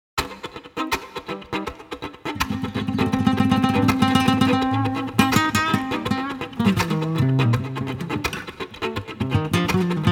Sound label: Rhumba